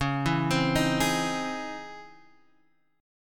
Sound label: Db Minor Major 9th Db Guitar Standard; arpeggiated; DbmM9; JGuitar